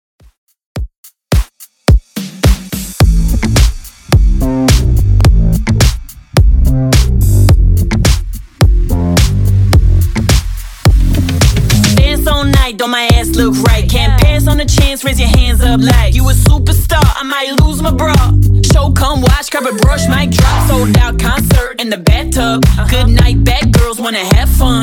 Top40 Redrum 107 bpm

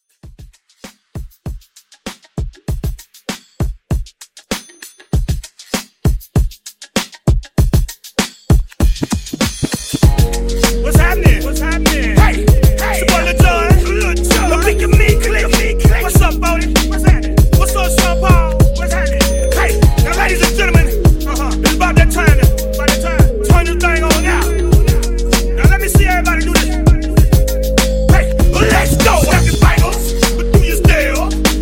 Hiphop Mashup 98

